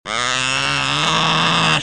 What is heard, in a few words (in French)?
Canard, Animaux; bruitage